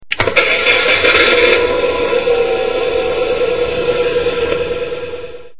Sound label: Ignition; Dodge